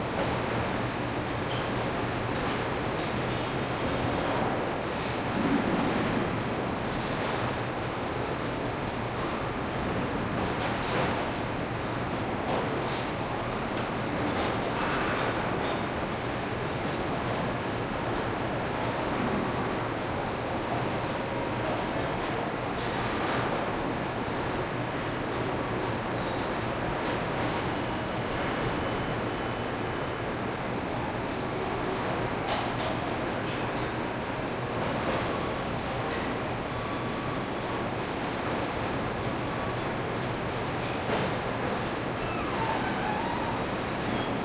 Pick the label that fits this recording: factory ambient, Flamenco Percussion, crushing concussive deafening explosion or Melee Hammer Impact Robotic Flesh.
factory ambient